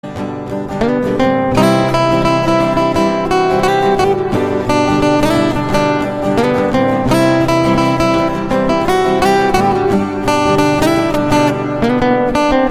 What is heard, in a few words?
Guitar Instrumental